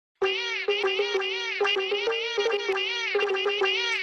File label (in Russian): кот мяукает в камеру но это фонк